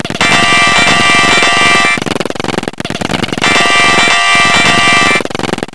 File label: POP